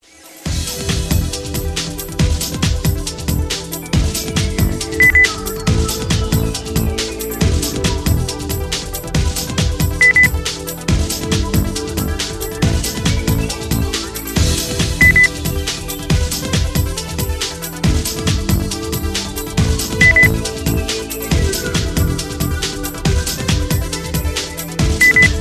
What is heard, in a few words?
percussion